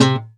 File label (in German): KONZERTGIZ, Alpine Volksmusik